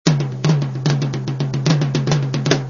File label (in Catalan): timbales